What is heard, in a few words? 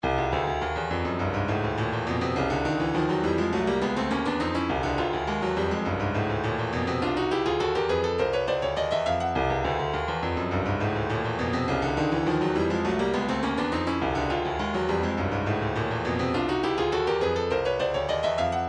retro funk 103 funky; two funky